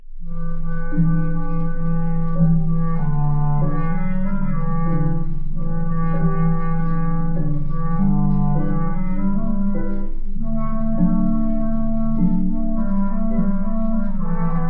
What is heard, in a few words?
folk